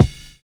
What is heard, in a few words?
SNAP; Univers Sons - Kitch 70s